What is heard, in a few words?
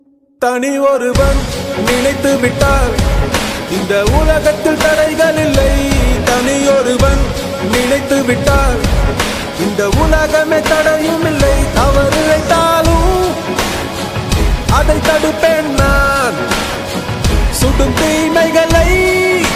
Remix Tamil Motivation Song Ringtone; Thani Oruvan